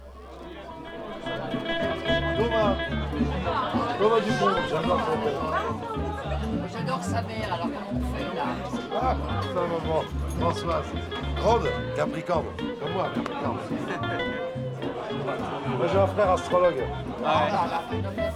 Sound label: Jazz Bar; Le Houdon Jazz Bar